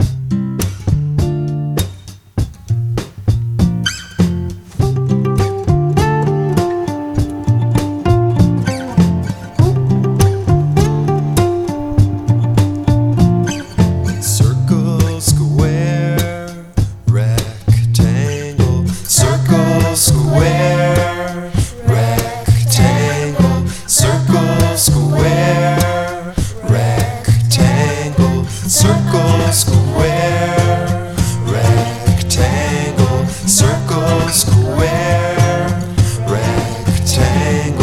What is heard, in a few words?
English Shapes Song; English Tree TV